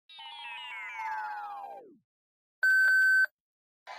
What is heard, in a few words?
death sound; sao